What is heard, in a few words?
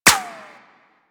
Bullet Impact Metal; Warframe; Weapons